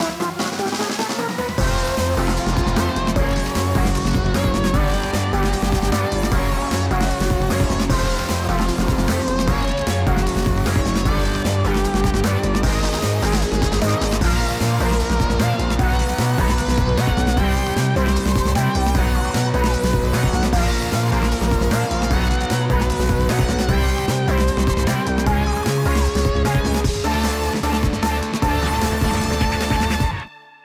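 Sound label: Loop Battle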